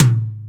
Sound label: drum hit clap